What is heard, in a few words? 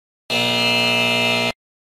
error